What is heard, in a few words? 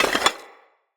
Clay Shuffle Texture; Soul Plane; Vendor